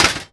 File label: Armour Hit; Civilization4